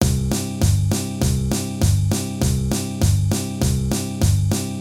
Foxtrot zaklad

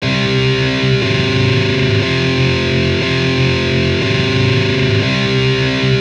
Powers akordy c fis ges g

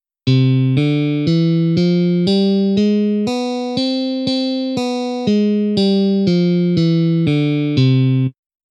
stupnice elektricka gitara harmonicka dur

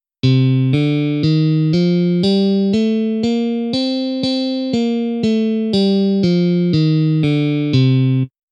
stupnice elektricka gitara dorska

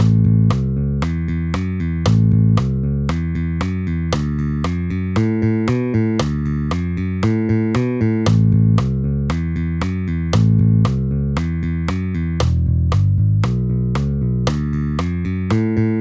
basa rock and roll

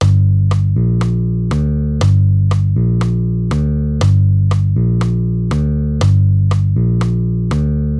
basa rumba Gmi; Basové figúry – Rumba

